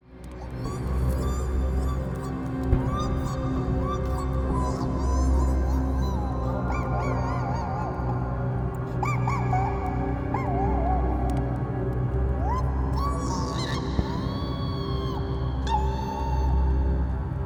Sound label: horror melodies